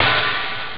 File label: hihat open; Amiga Soundtracker Sample Packs